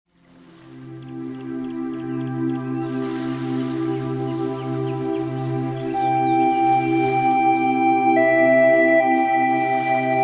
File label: Hulusi Chinese Massage